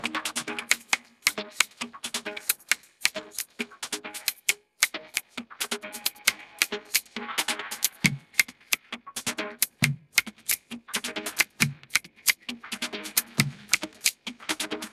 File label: ringtone afrobeats